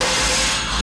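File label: blast, strange effects; Total Control - Action Cutz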